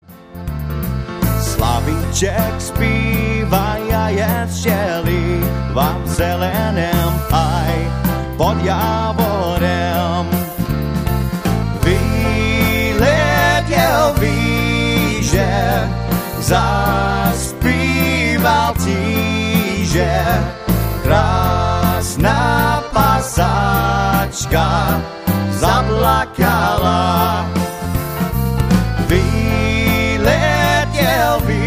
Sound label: Waltz